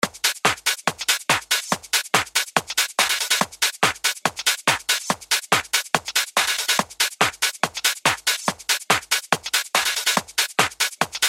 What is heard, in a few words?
90's Techno - Hardstyle